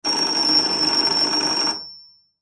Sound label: old fashioned doorbell